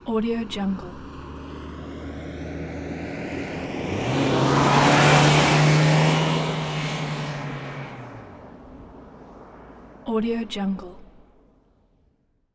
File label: volvo 740 sl in accelerate through pass by away; industrial sounds